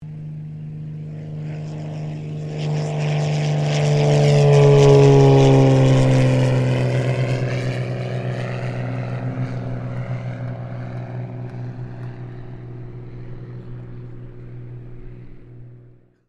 AIRCRAFT PIPER CHEROKEE 6-RG TAKEOFF, Light Civil aircraft; Airplane Sound Effects; Airplanes (Sound Effects:Vehicles)